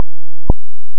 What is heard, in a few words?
square f1 h1024